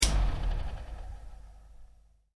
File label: Fan: Large Desk Fan Switched Off; Electronics; Stuart Duffield